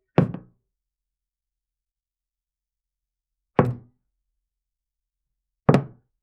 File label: cinematic clean sound of, domestic